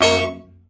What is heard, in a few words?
f major punch, Horns and Brass